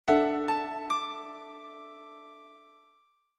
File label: notification; Aerith